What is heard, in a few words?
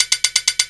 ratchet